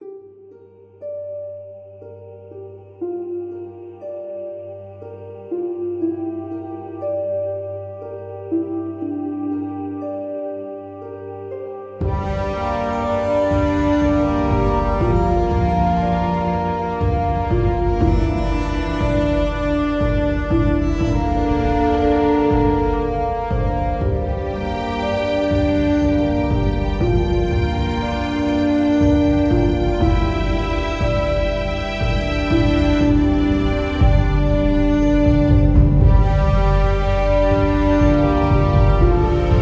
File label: fantasy loop music - start of war